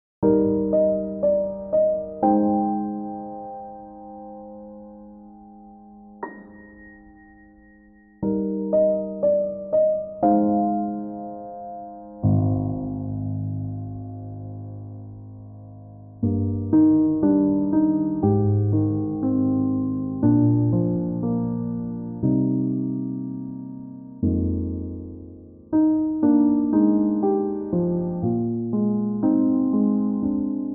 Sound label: Newage, 감성